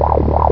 engine

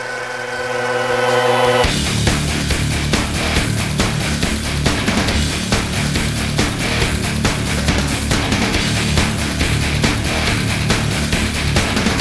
music

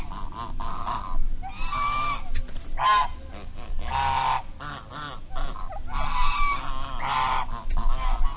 farmyard

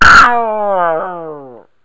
death; madpear

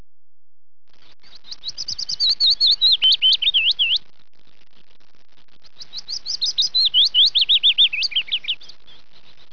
bird

